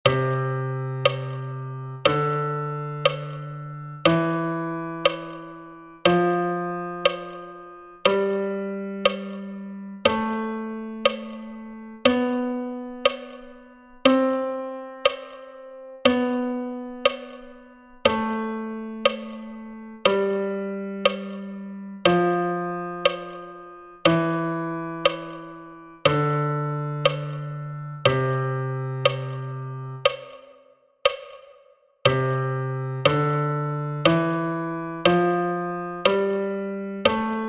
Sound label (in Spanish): DO Mayor, trombon